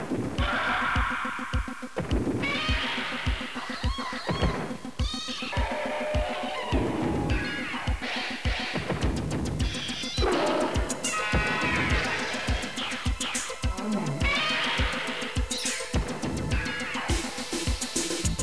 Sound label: growl